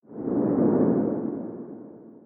gambler enter; DragonsLucky8WD; wazdan; gambling

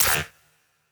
win; BARs 7s; wazdan; ultrafast

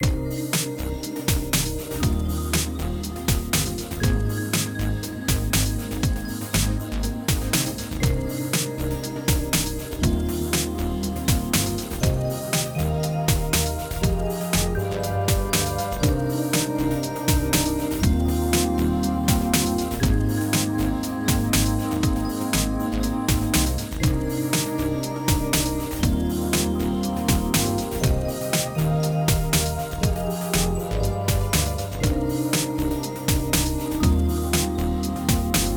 freegames ambient; Bell Wizard; wazdan

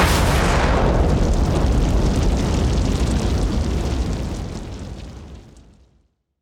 win fire gamble; DoubleTigersWD; wazdan

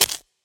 minitables hide; Burning Reels; wazdan

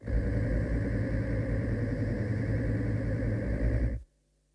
reels spin; DinoReels81WD; wazdan

